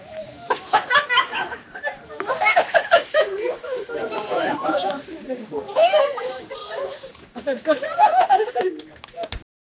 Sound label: group of adults laughing; social